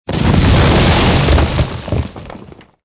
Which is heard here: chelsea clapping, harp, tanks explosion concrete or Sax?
tanks explosion concrete